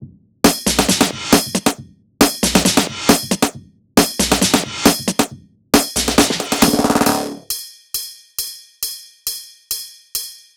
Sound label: Drums